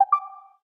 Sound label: Bluetooth alert; L20 D105f; LG